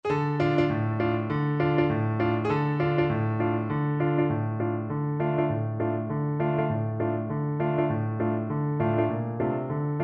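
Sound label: wellerman, xylophone, Percussion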